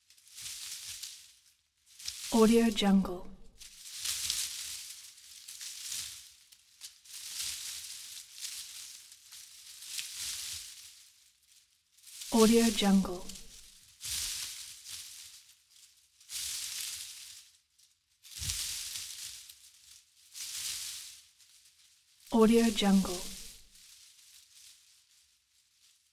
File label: leaf movement clean; راه رفتن میان برگ ها